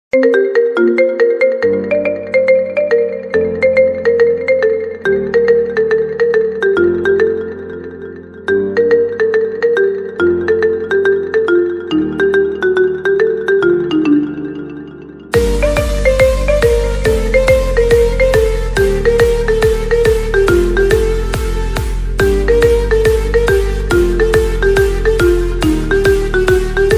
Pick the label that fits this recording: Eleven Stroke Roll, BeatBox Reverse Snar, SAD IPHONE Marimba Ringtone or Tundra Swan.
SAD IPHONE Marimba Ringtone